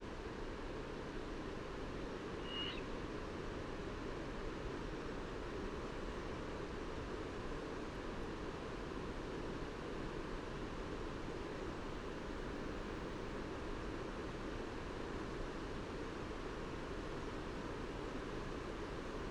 Turkish Fish Owl Hissing Begging Calls Of Juvenile